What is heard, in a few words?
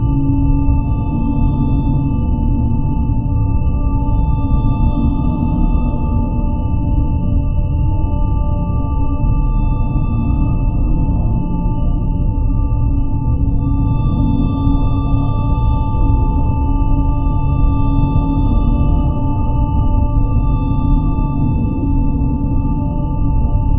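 futuristic sci fi ambiance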